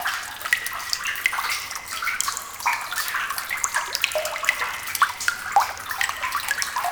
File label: Water Drip In Water; Ambience; Props; Warframe; Nineteen Ninety Nine